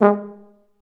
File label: F TUBA, stacc; Roland L-CDX-03 Disk 2; BRS Tuba